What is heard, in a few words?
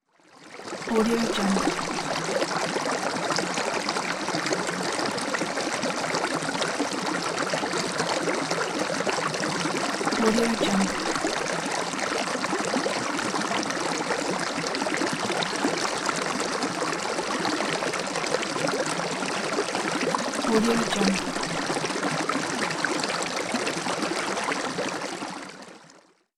gurgling water stream